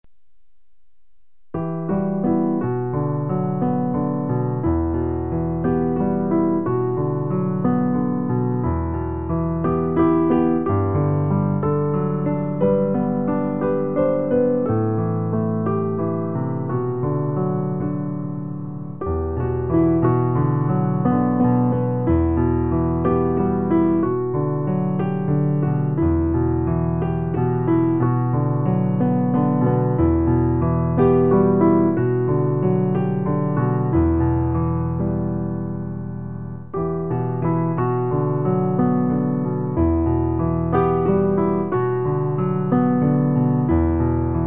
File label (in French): gloire a ton nom piano; recueil Louez Dieu